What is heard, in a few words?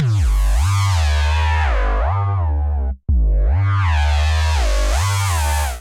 Reese G Minor 165 bpm, Bassline; MIDI Clips; Tonal; Skitter and Step; Ableton Factory Packs